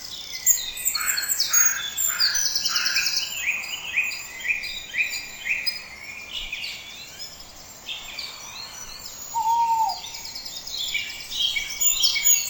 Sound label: owls